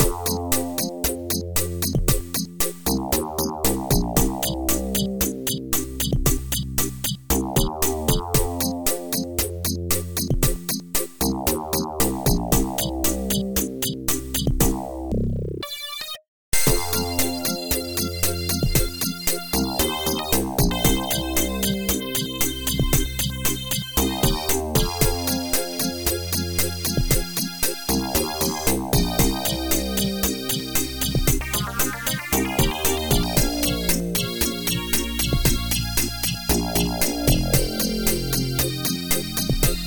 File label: Reggae